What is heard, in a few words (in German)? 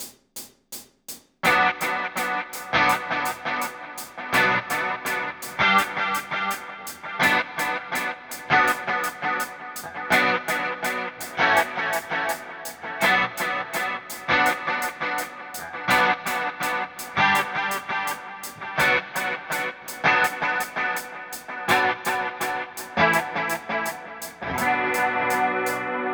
Coole Rhythmen mit Delay 166 BPM ¼ ½ Feedback 25